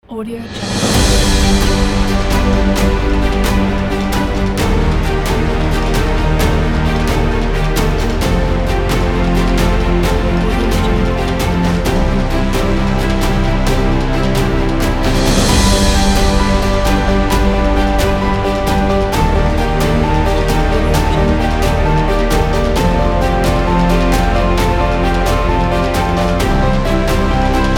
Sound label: inspiring uplifting orchestra; feetmusic